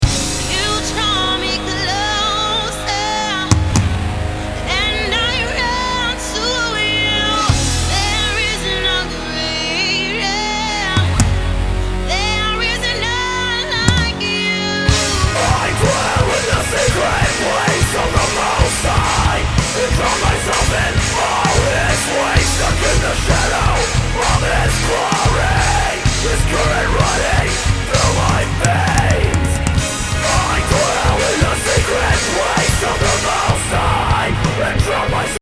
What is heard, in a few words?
Melodic Metalcore Rough Mix